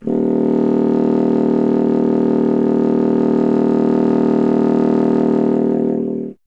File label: C. Bassoon, solo instruments